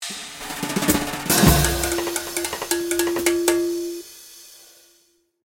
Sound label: Drums Crazy solo